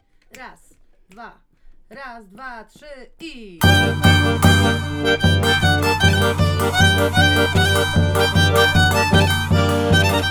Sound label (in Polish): Taniec Polka